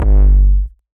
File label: 808 Tweaked